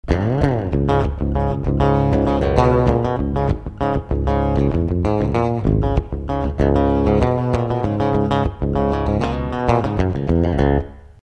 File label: Plate Verb